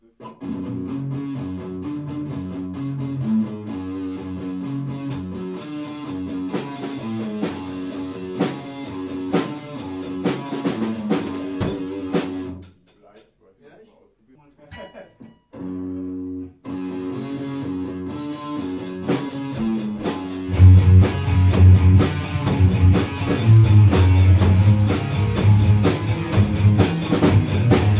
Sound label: Riff